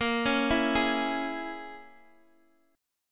A sharp Minor 7th Flat 5th, Guitar, Open G

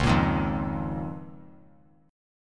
Db 6th Add 9th Db down; Db6add9 strummed; JGuitar

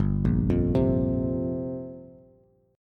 G Minor 11th, Bass, Bass Standard; arpeggiated; 3,1,3,5; JGuitar

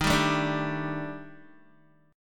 D sharp Major 7th Flat 5th D sharp Guitar, chord; strummed; 10,10,9,7,7,x; 4F,4A,5C,5G,5C,6E; JGuitar

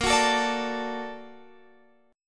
Bb Augmented Major 7th Bb, Guitar Open F; Bb+M7 strummed; JGuitar

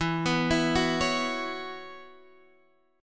B Suspended 2nd Suspended 4th

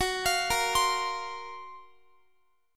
F sharp Major Sharp 11th, Guitar; F#M#11 Chord; arpeggiated; x,x,18,22,22,20

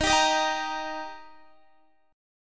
D sharp Major D sharp Guitar, chord; strummed; x,18,17,20,19,17; 4E,4A,5D,5G,5C,6F; chord shape

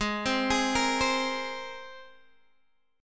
Ab Major Add 9th Ab Guitar Open D

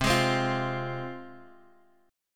C Suspended 4th Sharp 5th C; strummed; Csus4#5; 8,11,10,10,x,x

